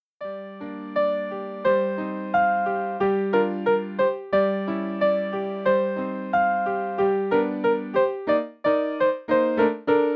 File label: piano solo; Concert Fantasy